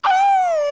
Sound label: Wolfos Hit; OOT